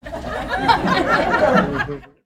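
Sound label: sound crowd laughs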